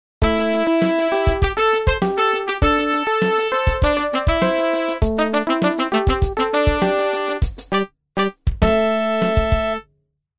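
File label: pops horn Section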